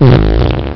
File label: fart